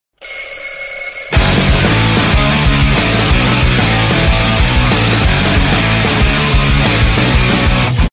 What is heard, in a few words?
rock music phone ring